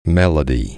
melody

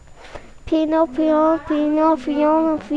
piano

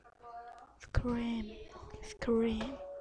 scream

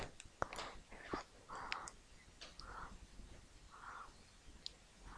glass

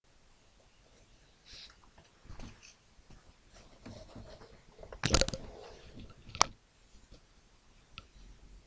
harp

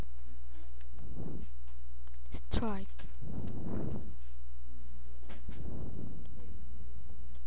strike

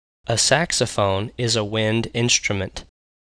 saxophone

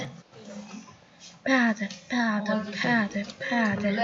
pad

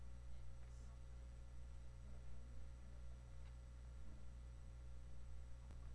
woodcarving

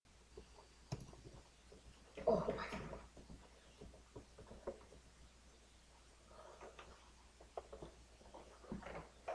sharpen

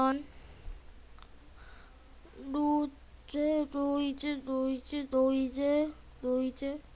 noise

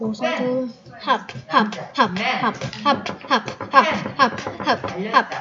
harp

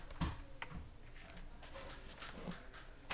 knock

